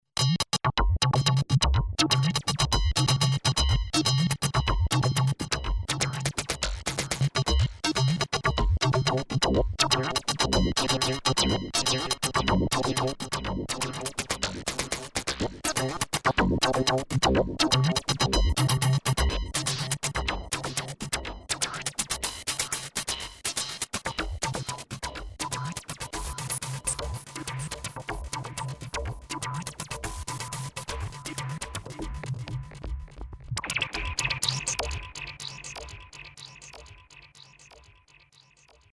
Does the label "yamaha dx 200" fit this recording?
Yes